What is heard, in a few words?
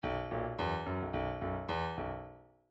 midi file grooves rock